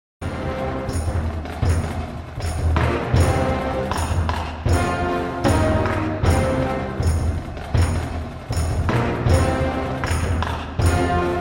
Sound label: Super Percussion Theme